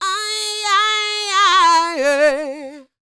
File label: D YEAH, Vocal R&B Dance; DB DANCE